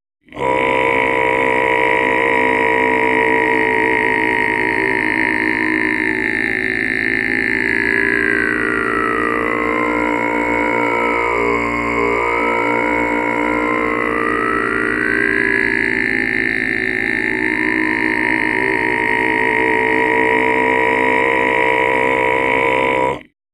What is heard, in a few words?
Reverse Overdub